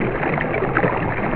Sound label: water